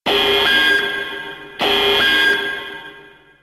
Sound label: alarm